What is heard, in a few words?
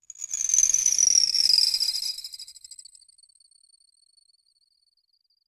generate a sharp crisp ic, ice